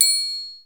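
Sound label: TRIANGLE, SR16 percussion; musicradar-synth-samples; Classic drum machines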